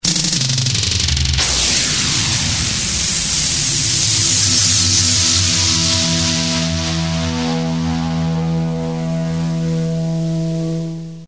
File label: drums sting; bailrigg fm; bfm jingles ads RSL 1994